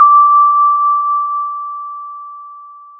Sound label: pop, Sound of a church bell